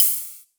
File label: Ride, Cymbals